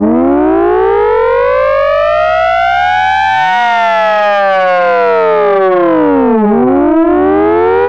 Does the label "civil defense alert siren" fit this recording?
Yes